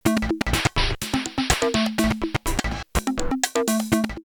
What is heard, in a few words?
Fractals 124, drumloops Rave; X-Static Goldmine; 90 s Sample CDs